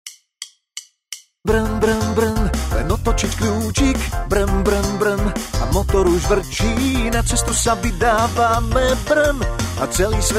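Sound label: Male Slovak sing mix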